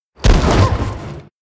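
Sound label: giant hound attack; monster; Senillinea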